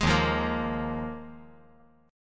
Dsharp Suspended 2nd Suspended 4th, D#sus2sus4 chord; strummed; 1,3,1,1; JGuitar